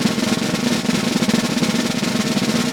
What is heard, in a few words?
Vintage Snare Drum